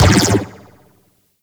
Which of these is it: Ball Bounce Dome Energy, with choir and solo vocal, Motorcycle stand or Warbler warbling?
Ball Bounce Dome Energy